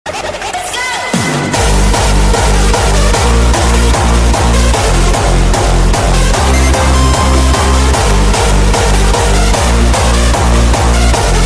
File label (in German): hardstyle klingeltone